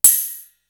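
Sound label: servo hat, cymbal; East West; Global Sounds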